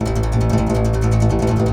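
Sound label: contrabass balalaika, Plucked Strings; E-MU Producer Series Vol. 7 – Old World Instruments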